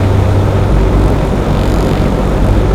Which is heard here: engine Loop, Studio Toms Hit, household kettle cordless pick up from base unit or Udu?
engine Loop